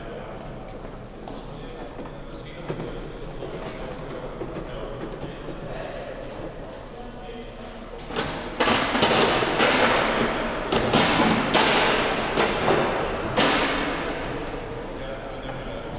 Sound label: foley